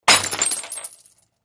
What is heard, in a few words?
Drinking Glass Smash